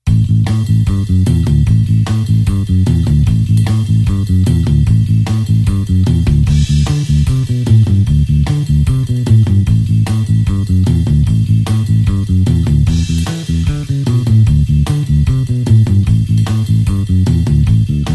blues bassline 8beat A tempo

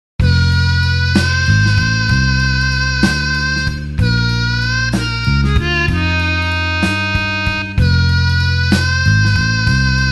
blues harp slow F

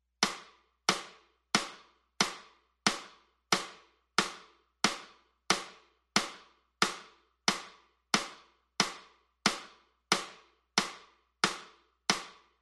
metronome 4 count

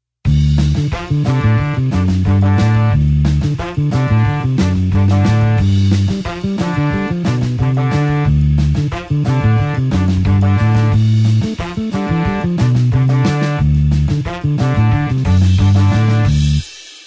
blues horns 8beat D

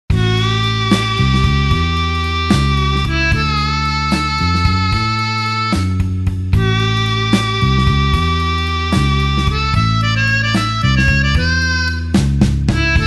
blues harp slow A tempo

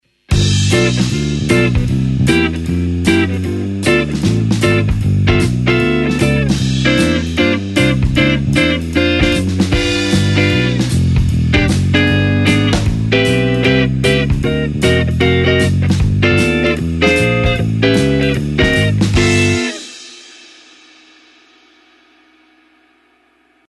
swing chord sample

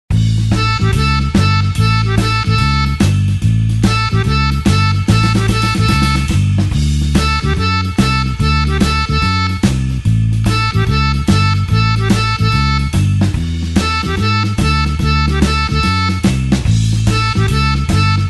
blues harp shuffle A

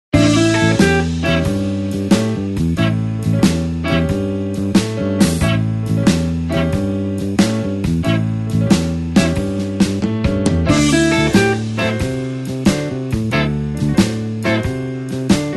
blues shuffle solo A call

